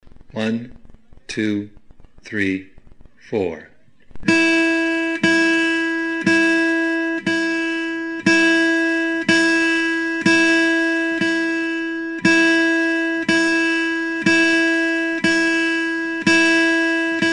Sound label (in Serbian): Excercise, Gitara